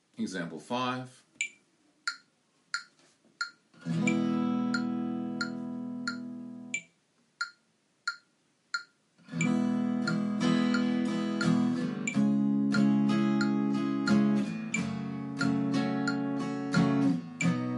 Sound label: Chords